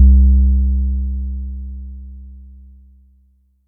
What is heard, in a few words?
Bass SIN; Yamaha DTXpress